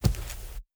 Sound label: walk carpet